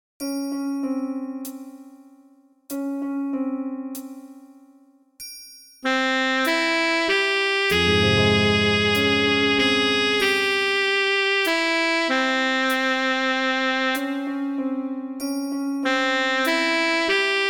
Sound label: sop, midi; britten ceremony